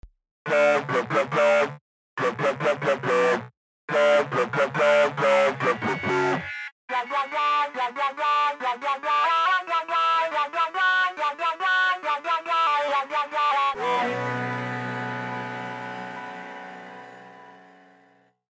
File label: ethereal workshop